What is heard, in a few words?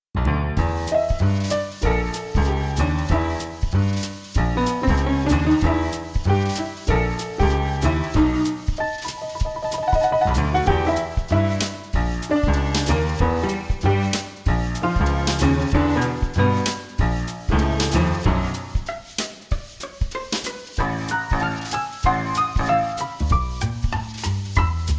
evil jazz; pbskids; go studio